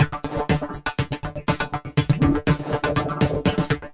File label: svank roughshod flanger, drum loops and breaks